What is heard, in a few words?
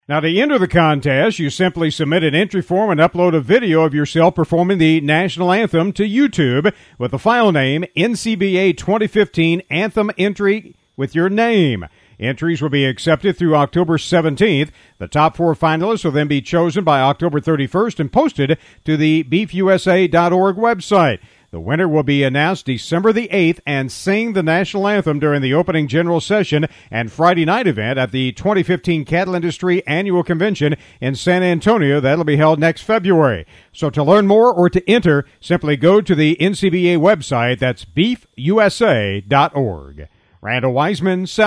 National Anthem Singing Contest; NCBA